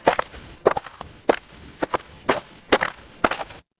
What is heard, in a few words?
footsteps gravel